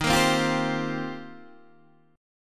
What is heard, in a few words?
D sharp 6th Add 9th, Guitar, DADGAD